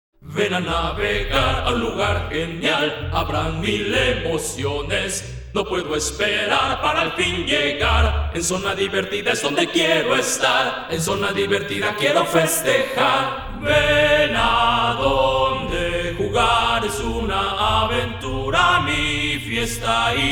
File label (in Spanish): solo cancion